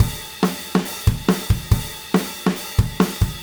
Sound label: Drums Rock Chorus, loops; Alex Sanfilippo